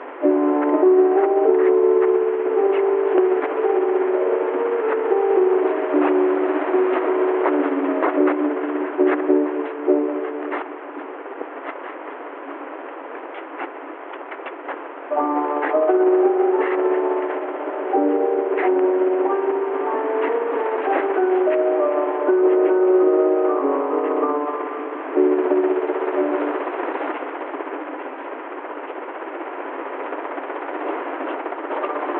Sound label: radio music piano static; DayZ Epoch; effects